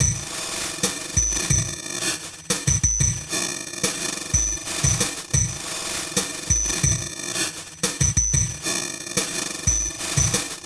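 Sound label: Annoya Loop, beats; Sonic Foundry (Sony Creative Software); Esoterik Beatz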